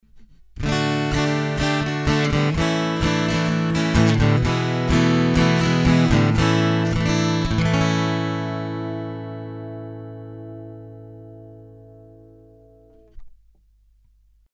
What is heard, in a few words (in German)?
Gitarre